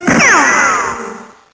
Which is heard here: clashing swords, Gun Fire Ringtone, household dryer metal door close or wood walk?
Gun Fire Ringtone